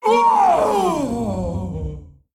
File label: Death